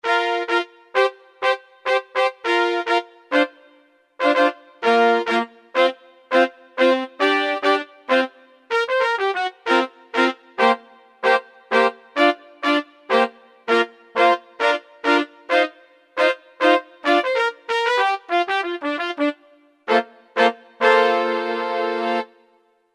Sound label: Jazz Lab Band, Kurzweil K2000; Kurzweil K2000 Contemporary ROM